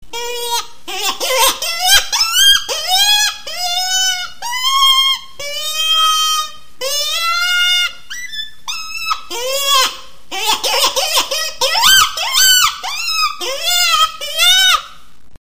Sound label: Deer Distress Sounds Car Horn